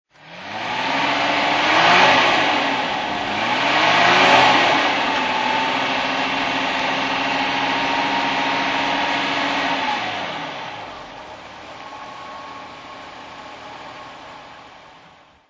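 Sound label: jaguar XJ12 throttle, Engine sound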